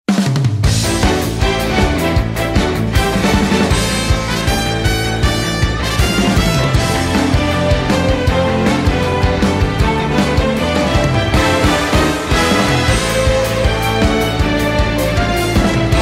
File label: Battle music